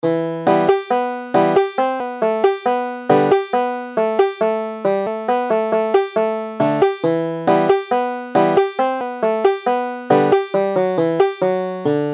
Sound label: banjo